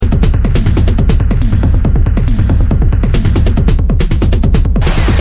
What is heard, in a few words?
loop